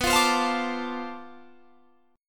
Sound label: B Minor 7th Flat 9th Flat 5th B, guitar chord